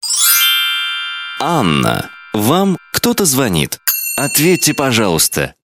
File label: notification; oppo